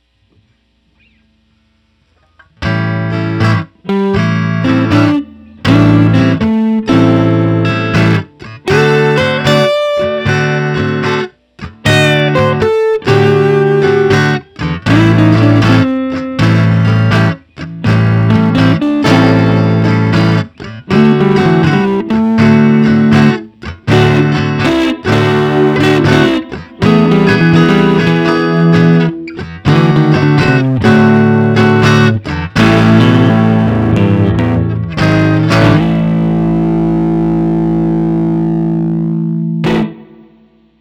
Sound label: Blues 12bar A minor